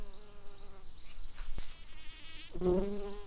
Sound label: bumblebees, Hypnorum workers